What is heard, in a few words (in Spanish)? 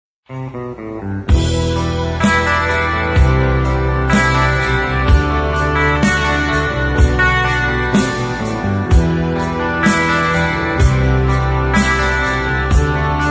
Rock Melodico